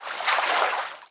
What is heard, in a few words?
Floatswim; Lara; Playable Tutorial Level